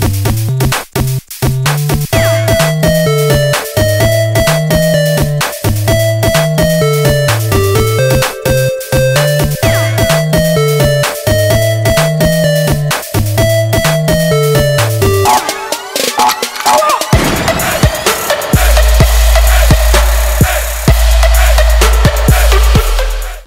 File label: trap remix; crash bandicoot